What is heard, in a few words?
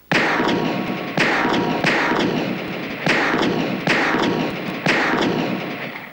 Shots Multi, Shots Being Fired